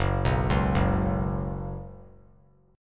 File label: Gb Suspended 4th Gb, down; arpeggiated; JGuitar